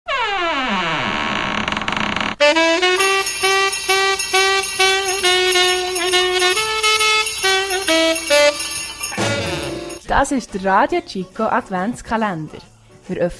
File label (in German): jingle advent; Adventskalender